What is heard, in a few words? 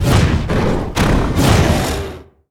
Thrust; Ragefighter